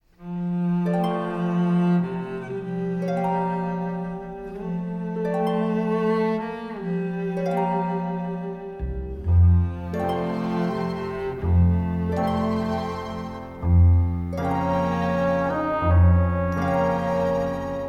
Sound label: distant melody